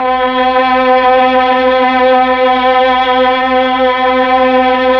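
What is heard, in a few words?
Mellotron Strings C4, Slow Strings; Keyboards of The 60's and 70's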